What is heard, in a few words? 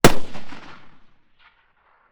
Rifle Mauser 8mm Medium; World Wars; WatsonWu; Rifles & Pistols Of The World Wars